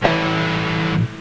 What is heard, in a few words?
guitar